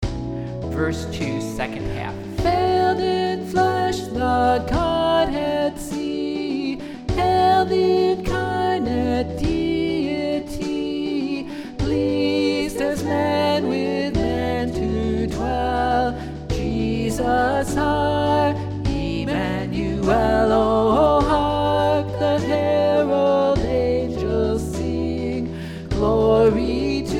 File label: Alto; Hark the Herald